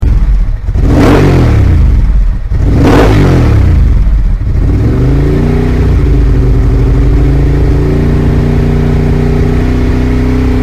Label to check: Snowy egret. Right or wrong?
Wrong